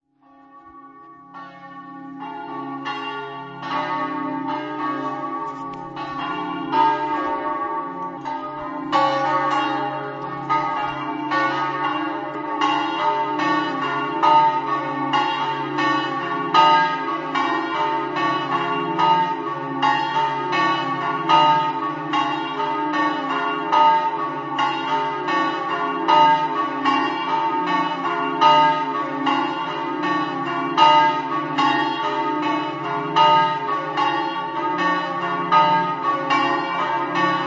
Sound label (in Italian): campane; socchieve